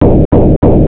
auto bloop boom; TacOps

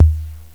Analog Tom, drums; TG300B

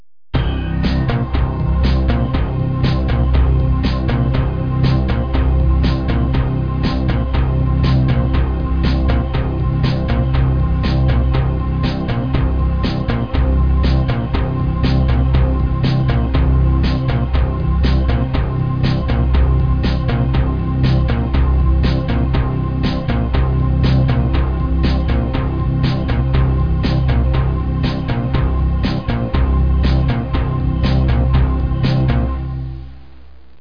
DANCE LOOP; Blender Volume 2

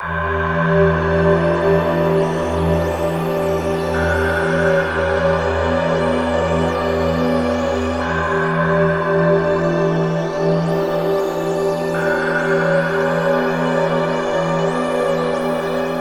Synt; SYNTH; 120 BPM

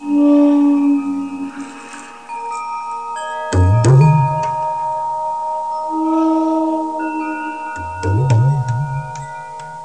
RHYTH, LOOPS

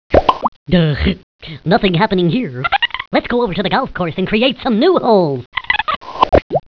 GOPHER PIT

